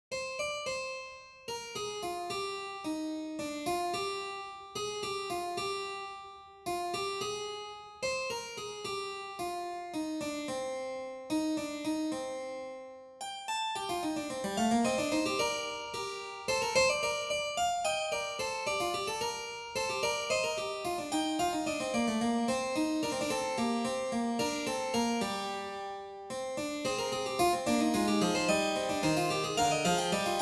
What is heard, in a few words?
fugue